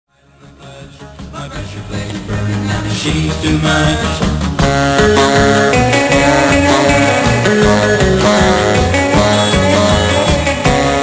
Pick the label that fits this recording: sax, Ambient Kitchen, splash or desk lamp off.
sax